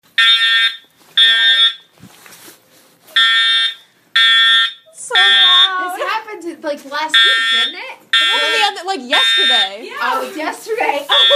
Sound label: Fire